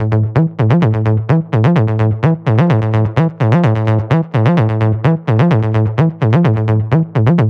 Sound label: Melodic Loop, A min 128; Melodic Techno; Worry; Ghosthack; AC2024 - Day 12 - Melodic Techno